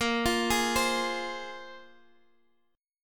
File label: C 7th Suspended 4th Sharp 5th